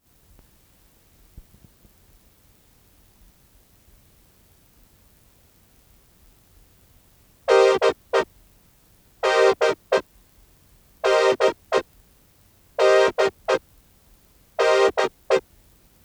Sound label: Reface CS Chords